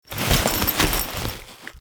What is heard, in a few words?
Foley Stand; Warframe; Characters; Kahl